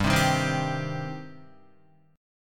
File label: G Suspended 4th Sharp 5th G down Guitar; strummed